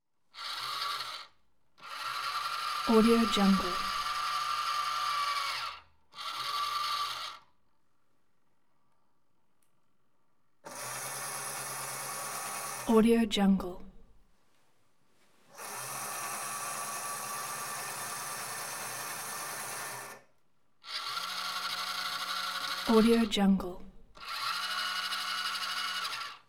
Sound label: observatory telescope motor; collanderson